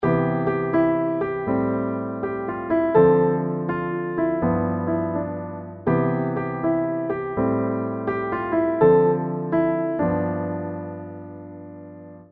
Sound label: Piano F